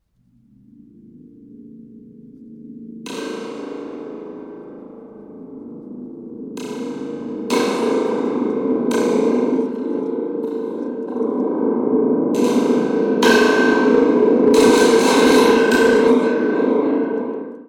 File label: Springdrum